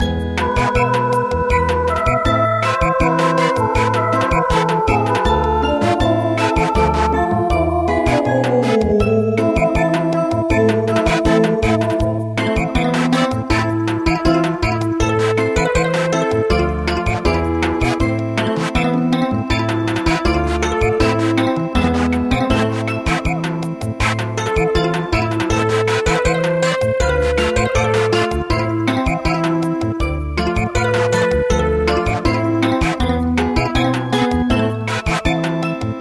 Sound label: Samba